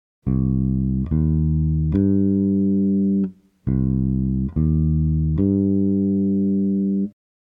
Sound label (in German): Moll Dreiklang, Bass; Bass Unlimited